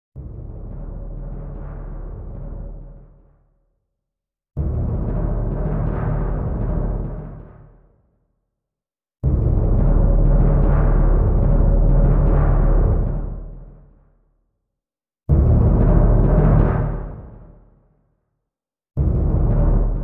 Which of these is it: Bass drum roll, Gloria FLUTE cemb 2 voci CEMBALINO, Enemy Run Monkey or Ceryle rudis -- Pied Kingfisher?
Bass drum roll